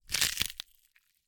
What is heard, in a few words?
gore - flesh and bone crunch - hard; Timothy McHugh; Gorification [HD]